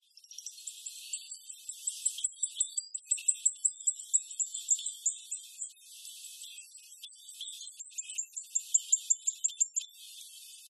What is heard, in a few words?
Boophis vittatus